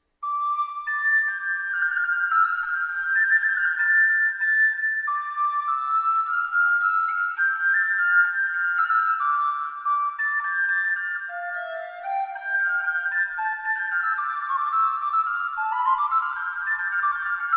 Petit Branle; Jacob van Noordt; Tweede

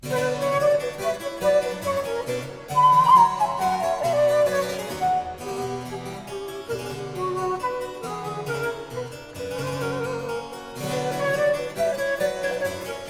Flute Sonata in C Minor Menuets I et II; No. 6; Op. 91

